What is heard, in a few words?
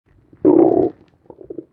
gurgle; shweets sim; snivy